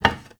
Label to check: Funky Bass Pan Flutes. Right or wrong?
Wrong